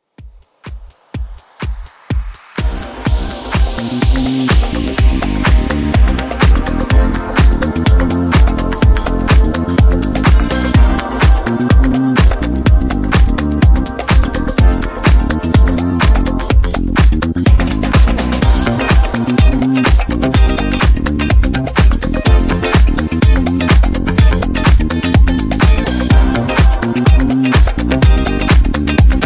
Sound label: 125 Bpm; Redrum